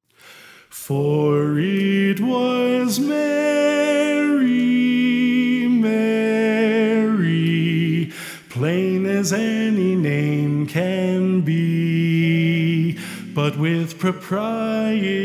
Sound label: Lead